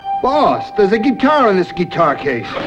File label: guitar